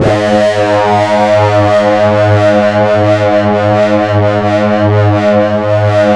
SYN LO RE; AMG - Now CD-ROM (Roland); Pure Gold

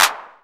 808 CLAP; Univers Sons; Basicussions

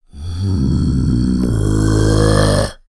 GROWL, VOCAL FX; Spectrasonics Vocal Planet; Jazz FX

